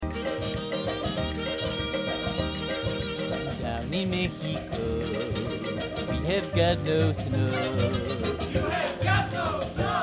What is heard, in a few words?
jingle bells